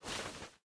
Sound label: cloth quick; smite; scylla